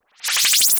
Air swish med

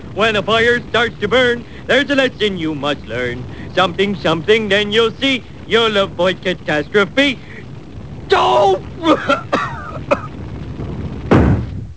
fire song; Simpsons

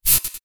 Electric jab

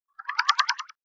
Heli wash up

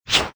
Up tube

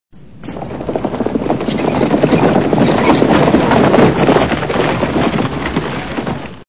wagon wheels

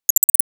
Beeps short series

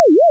blurp

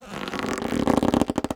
Rubber suit

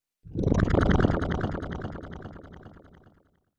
Wizard laugh

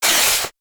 Paper slide

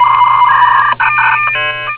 modem

